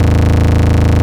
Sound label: Jungle Kick Roll, Roland BK-5, Kicks